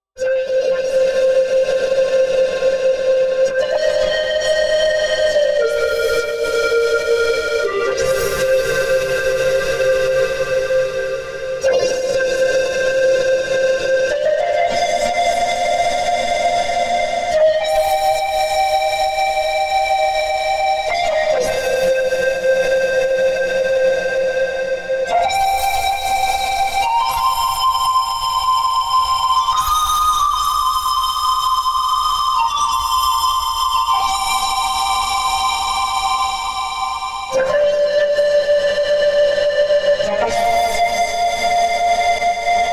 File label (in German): WALDORF QUANTUM SOUNDBEISPIEL